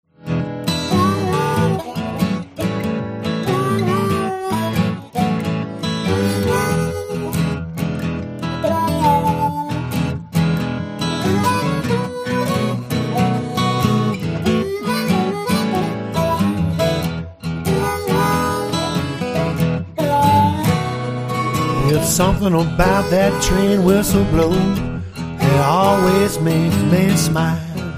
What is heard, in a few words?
train whistle blues